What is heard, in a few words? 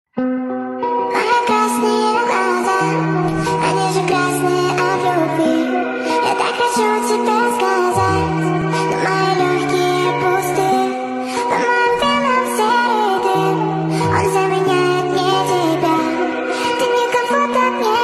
speed up